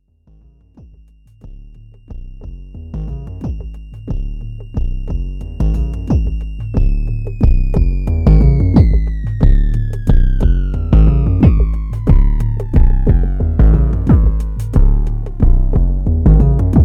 Filtering; GRP A4; xBase09

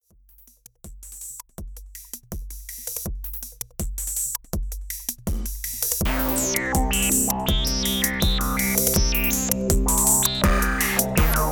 SH Filter Analog Rytm

